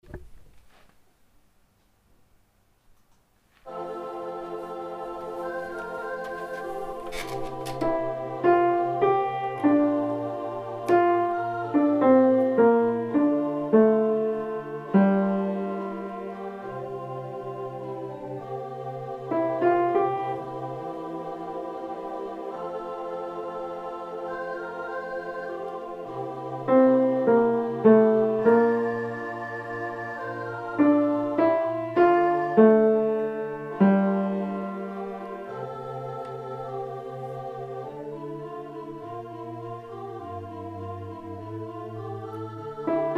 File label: Caresse Sopr.